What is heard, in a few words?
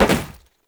Step Metal, Foley; Warframe; Oraxia